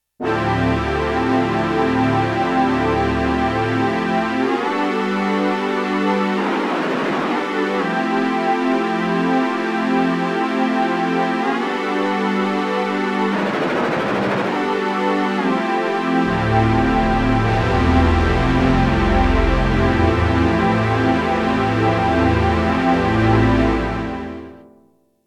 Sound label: Prologue A, Roland JX-1; Factory presets; Additional factory patches